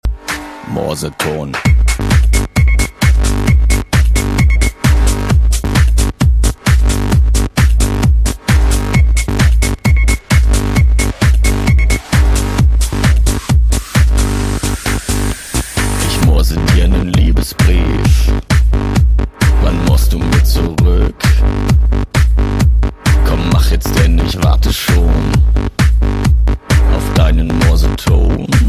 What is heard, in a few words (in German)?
Morseton